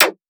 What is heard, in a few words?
tearout bass; M8; percussion and foley